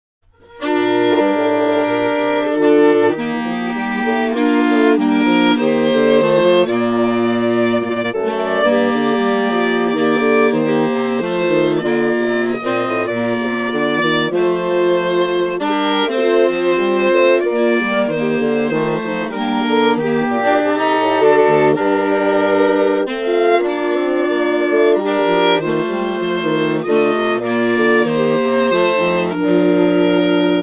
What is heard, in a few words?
Bach Chorale SF Chroma resyn